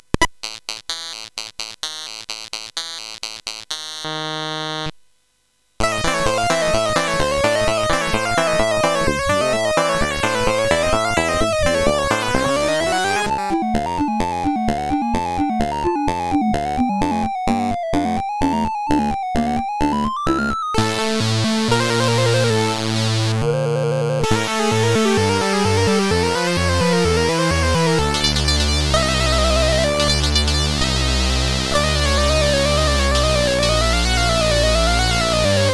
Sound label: Buzzzzz sid CSG8580R5